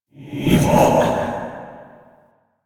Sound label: Whispers; Warframe; Tenno; Grimoire